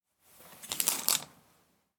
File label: Sliding Clothes On Rail